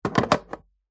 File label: household telephone landline receiver hang up